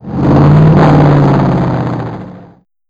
menu prev

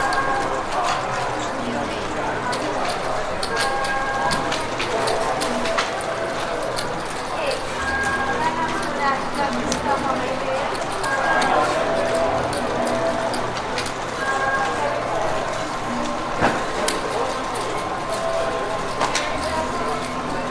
birds

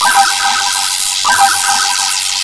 electro zap; tetrisfest

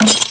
toybrick

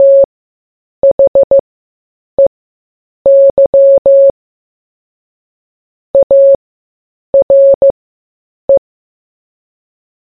eagle; mansion

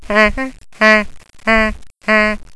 town bell; radiokootwijk

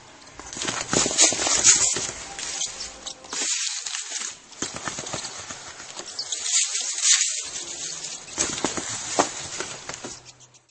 bats

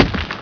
bomb bounce

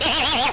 honka

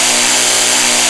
moto

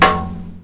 toybrick; roof1

